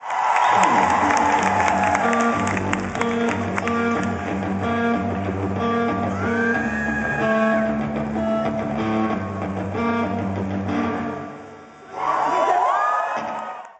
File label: Riff